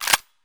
mpx weap bolt out; Escape from Tarkov

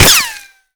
impact dirt; weapons; Modern Warfare

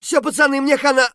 hurt near death; Escape from Tarkov; scav3

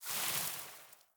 ship engines; World Of Warships

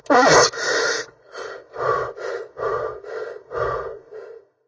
redout long; War Thunder

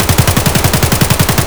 ak74m indoor close loop; Escape from Tarkov

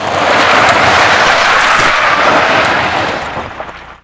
tire skid dirt long; Call of Duty Modern Warfare

